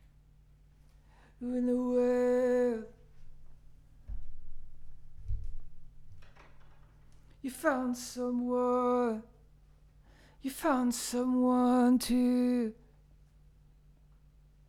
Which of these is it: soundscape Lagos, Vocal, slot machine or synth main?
Vocal